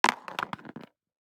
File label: DICE on Cardboard, Throw and Roll, Standard, Two Dice; Samples; ArticulatedSounds; DICE Sfx Party (Extended)